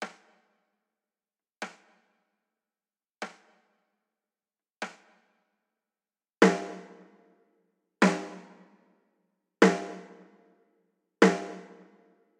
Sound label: drums snare